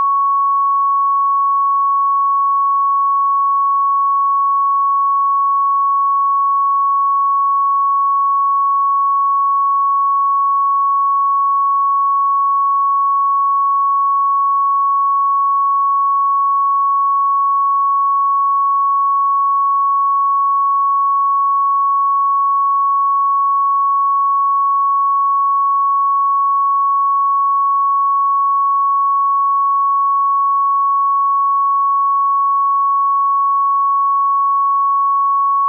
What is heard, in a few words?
1111hz; manifestation